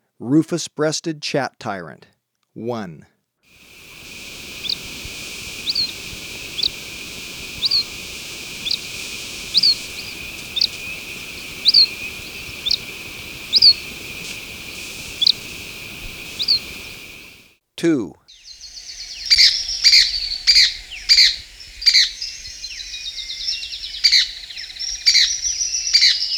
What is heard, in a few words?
Rufous-Breasted Chat-Tyrant, Birds of Ecuador; S. America